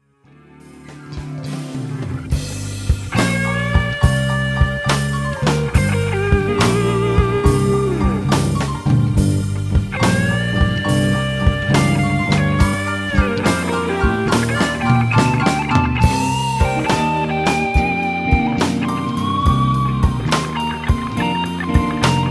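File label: G minor Electric Guitar Blues; production music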